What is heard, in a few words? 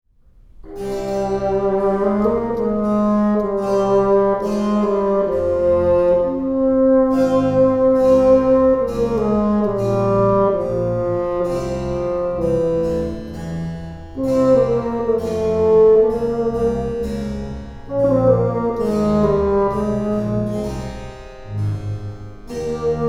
sonata in f minor for bassoon andante